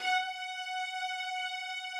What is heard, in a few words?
strings